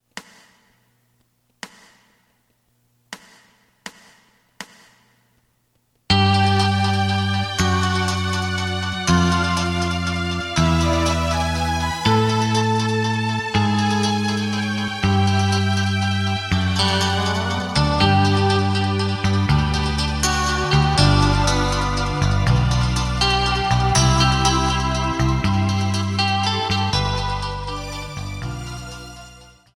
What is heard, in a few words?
drum